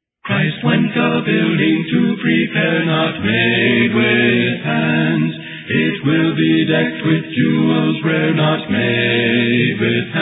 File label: a capella hymn; not made with hands